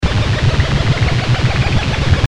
エネルギーを集める

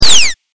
8bit ショット

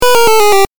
8bit 失敗

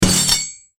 斬撃

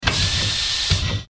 大型ロボットの駆動音

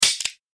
弾を装填

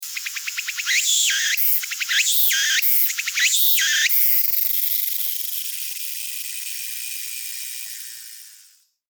ツクツクボウシ サビのみ

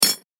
刀の鍔

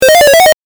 8bit 奇妙

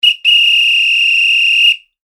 笛 ピッピー

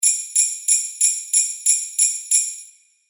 ジングルベル 8連続

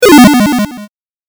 8bit 下降

